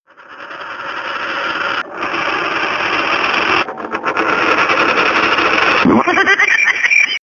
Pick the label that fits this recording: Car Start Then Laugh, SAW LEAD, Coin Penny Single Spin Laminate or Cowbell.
Car Start Then Laugh